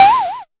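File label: mbrute percussion, Arturia MiniBrute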